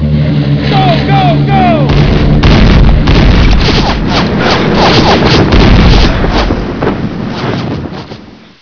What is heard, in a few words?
flak hit